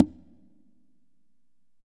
Congas wet, drums percussion

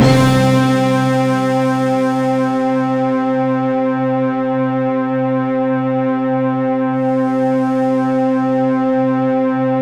C3, synth; 90 s Sample CDs; NiceBeats; SynthLine VOL-27

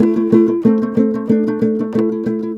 TOPSHUR; Soundscan vol.60; Asian Traditions; AKAI

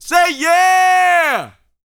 VOCAL, R&B Dance, HIP-HOP

